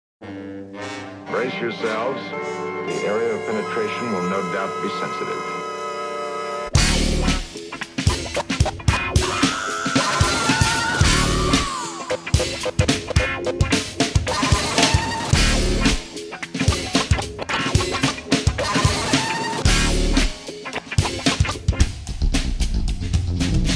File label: Fuzz